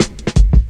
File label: Drum Loops, Jungle